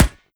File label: rubber, bullet impacts; physics; Half Life Model Pack